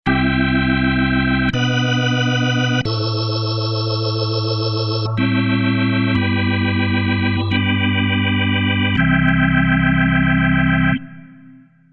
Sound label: Crumar Mojo Desktop; Brother Jack